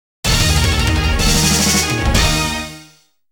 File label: GAMEOVER; virtua cop